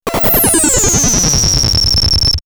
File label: Ufo Collapse, synthfx; Concussion; X-Static Goldmine